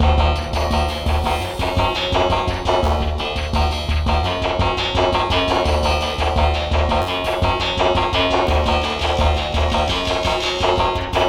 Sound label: percussion FM